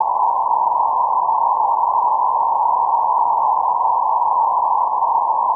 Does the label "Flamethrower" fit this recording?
Yes